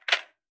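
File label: gear rattle weapon medium; viper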